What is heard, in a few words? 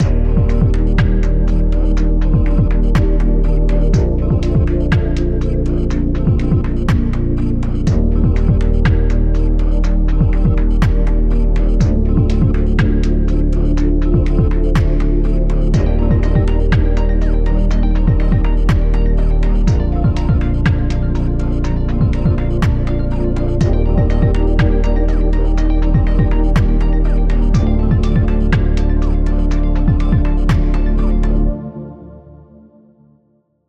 Ambient; Ambient Music Pack Vol. 11; Astro